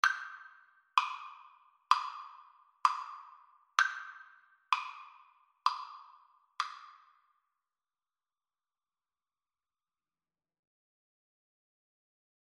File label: tuba mirum, Trombone